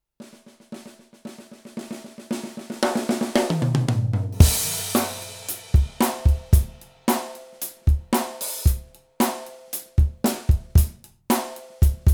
Aerodrums

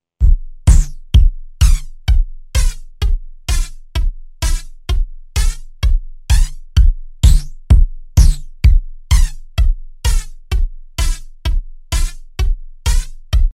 Flanger

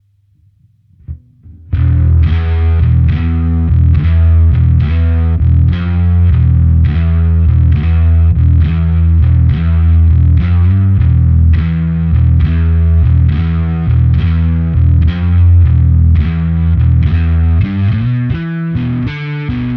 Distortion Alpha

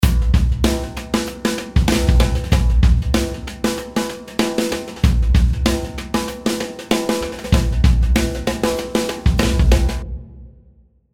Drums Monster Drum Hall

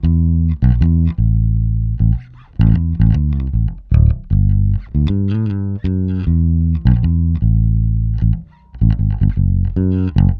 Bass; UAD-2; Waves VEQ4

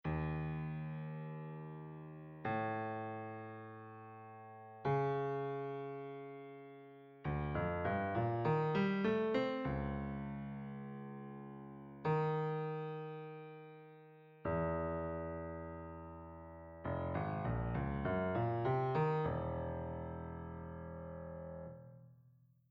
grand piano clean

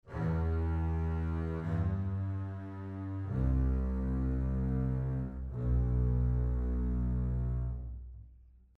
Strings 4 Dbs Portato

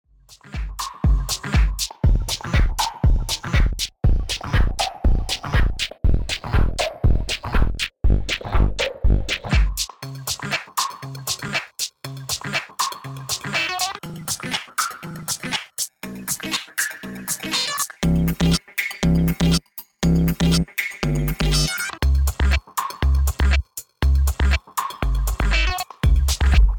Pitch down and up and up modulated

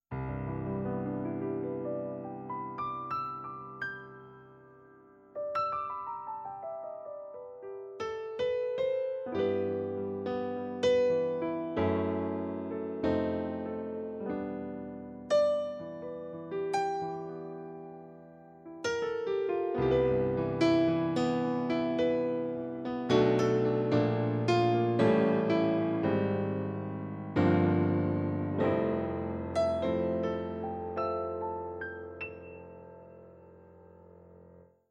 NumaStage Concert Grand

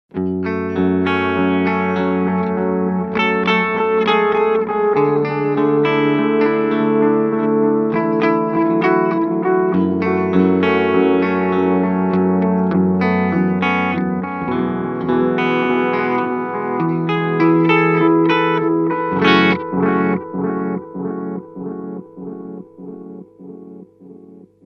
600mS; AnalogMan ARDX20

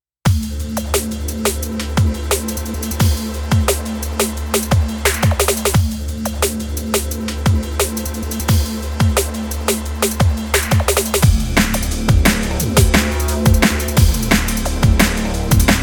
DnB

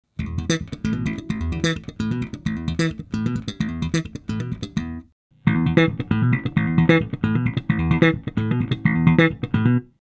Slap Bright Boost

